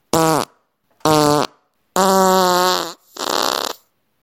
fart

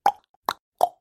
male tongue mouth click

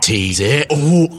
ringtone

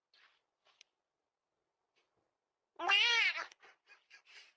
cat meows at ring camera

